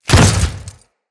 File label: Step Flesh, Footsteps; Grineer